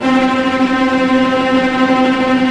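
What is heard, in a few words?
cello tremolo staccato; Digital Sound Factory Virtuoso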